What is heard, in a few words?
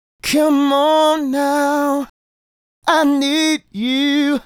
Male Solo, Hip-Hop and RnB Vocals